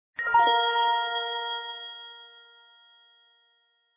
Descendent Arpeggio; NIU N109 Niutek

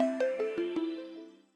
front camera rhythm pushed; Vivo X27 Pro; ui

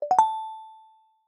notification simple; Google; Material Design sounds

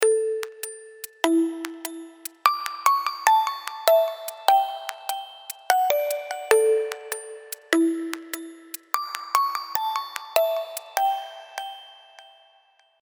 Chill Innovate, ringtones; TCL; REVVL 4 Metro

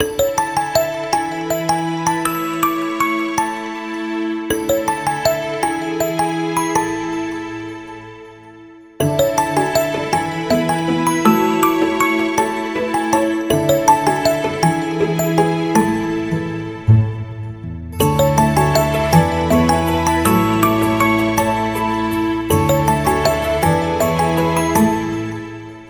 Ice Dance, ringtones; Honor; 600 Pro China

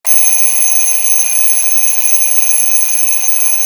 music alarm clock, alarms; Samsung GT i7680

